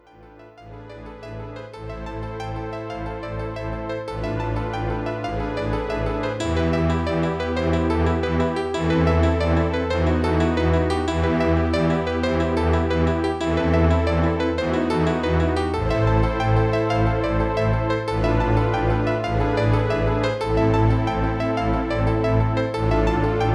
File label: Horror; Oscar; Amiga